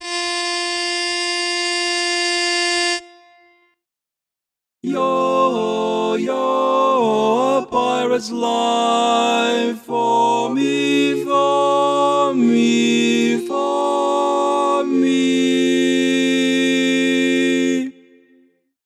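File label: Baritone, barbershop tags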